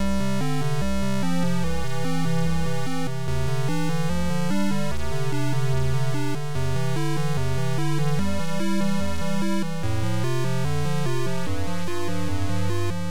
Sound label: Mus Box